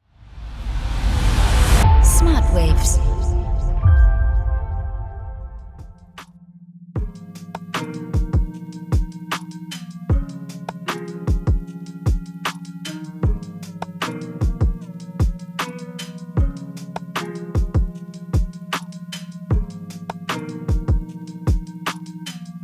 chilled focus; Beta pro

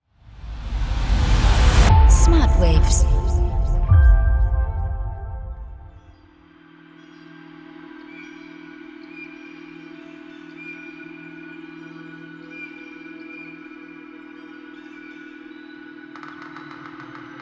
Mind Melodies; Awakening Mind Melodies

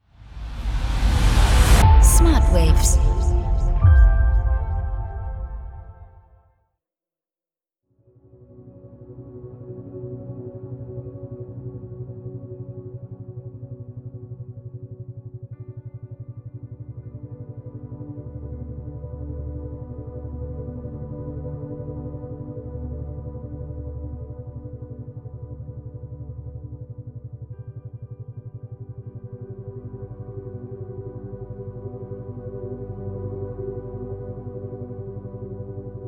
Calm Fields; DELTA pro

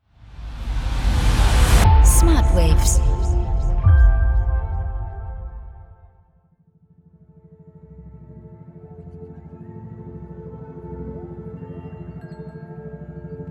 Country Morning; ALPHA pro

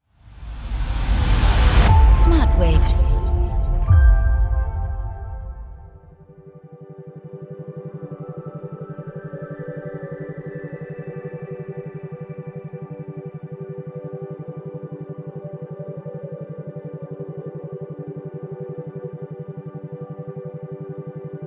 floating sounds

